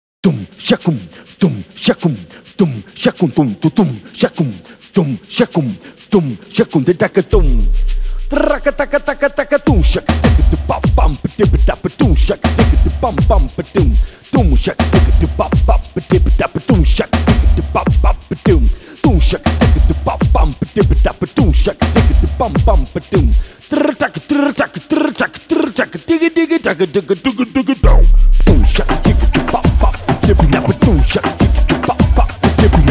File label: Samba Dance